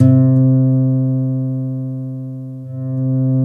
C3, SynthLine

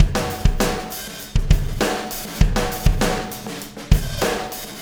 Wormhole Beat, Processed Drumkits

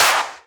Claps; Vengeance; Essential Clubsounds Vol.4

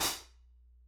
Soft Xtra Dark, Hats; Airwindows

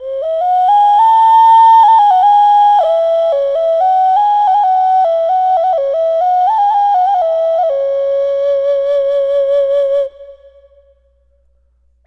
Aztec Dog Occarina

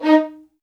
MED ATT D#, soft violins; Q Up Arts; Denny Jaeger Master Violin Library

